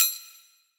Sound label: Kitchen Foley FX Drums; Antidote Advent; Kitchen Foley Sounds & Loops